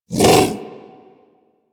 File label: Predasite Vocal Bark; Warframe; Hunting Critters